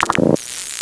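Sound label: laser mine damage; zombie plague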